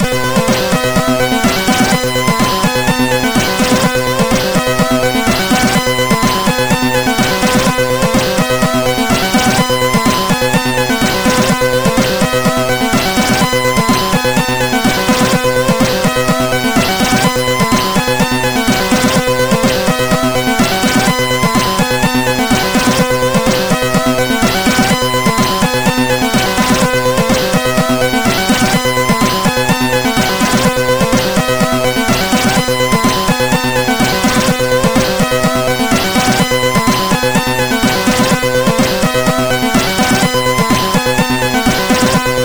Trance, MOS6581R4

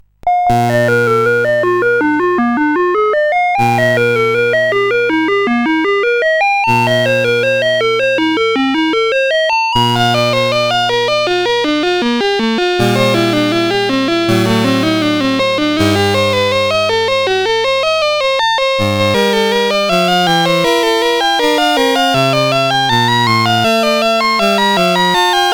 Partita 1 Allemande sid CSG8580R5; hvsc

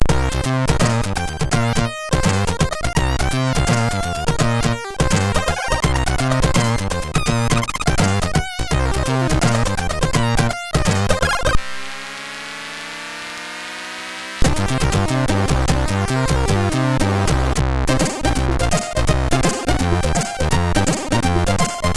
Test Tunie, MOS6581; MOS6581R3

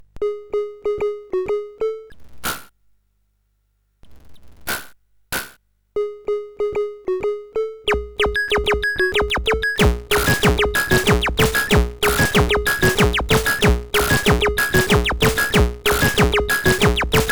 Best Beat, sid CSG8580R5

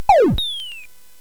SFX; Narco Police; hvsc

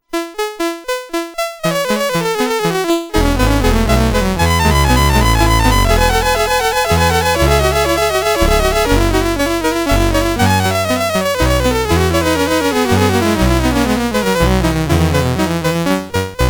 Invention in F, Commodore, CSG8580; CSG8580R5

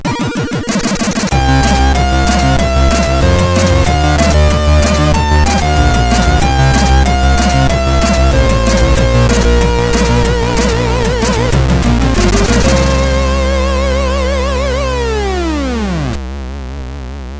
Jingles, sid CSG8580R5; Freeway 86

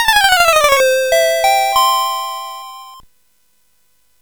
Solomons Key, CSG8580R5

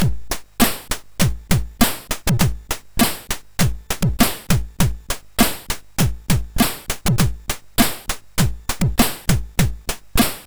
Beat, MOS6581R4

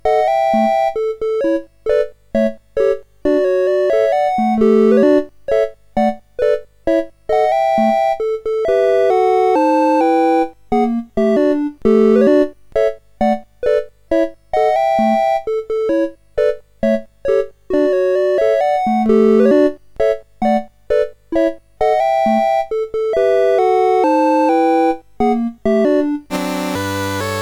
CSG8580R5; Dave Marquis

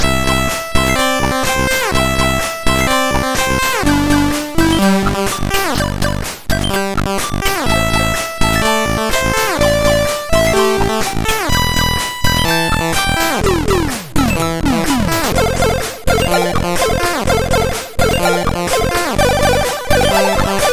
Bird and Cat, MOS6581R4

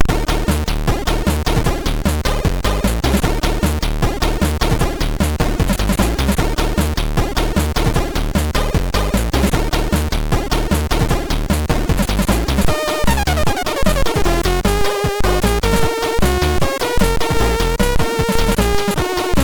Intro Tune, MOS6581R3

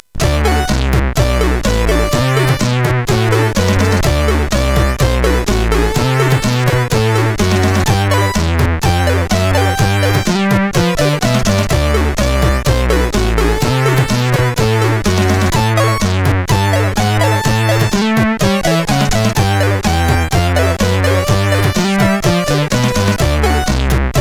Blues, CSG8580; hvsc

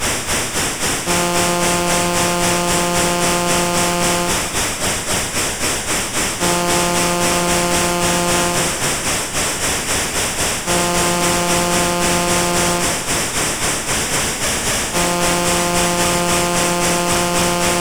Train with Whistle, MOS6581R3; Victor Street Music

